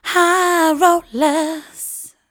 VOC, Disco Fever VOCALS

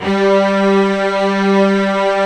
STRINGS G2

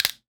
Celery Snap, household rhythm samples Hits

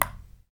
Ping Pong, FX Perc; Roland L-CD701

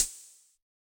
HH, Yamaha DJX 2B

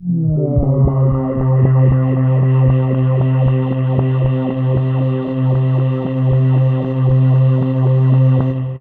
Pad; Dream Zone

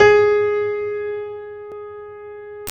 PED FF, GRAND PED

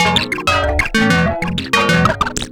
(arp) serge; Sonic Foundry (Sony Creative Software); Ugly Remnants VOL-2

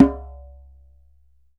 DJEMBE, African Perc; E-MU Producer Series Vol. 7 – Old World Instruments

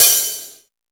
Jazz Drums, E-MU Pro-Cussion

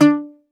Greek Bazouki, Plucked Strings

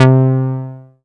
Rubber Bass; Best Service Gigapack 2

